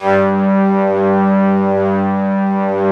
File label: ST-ORG, STRINGORGAN, Yamaha VP-1; Music Store; 90's Sample CDs